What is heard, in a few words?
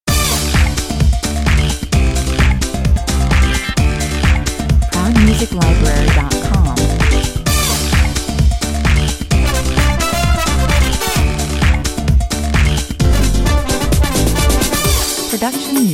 samba 130 bpm